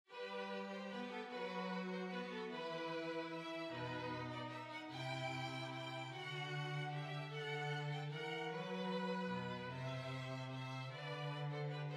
Waltz

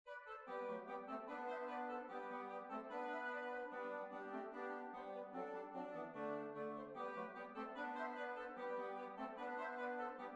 Grand Hornpipe

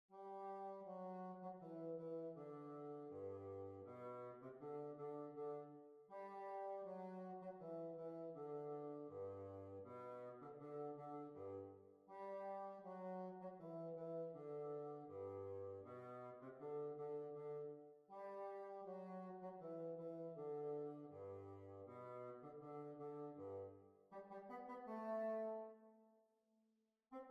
Hornpipe Morpeth Rant

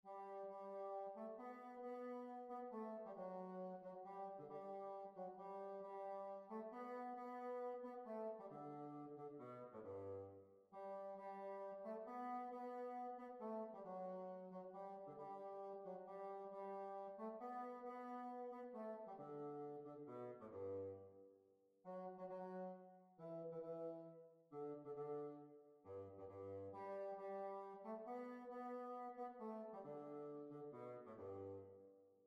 Tyrol Waltz

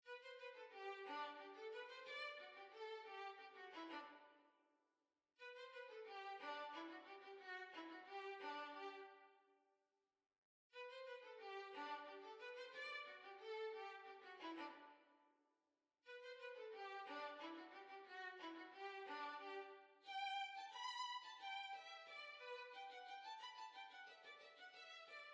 Violin

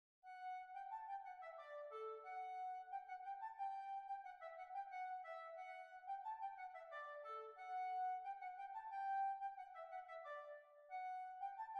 Lancashire Hornpipe B flat

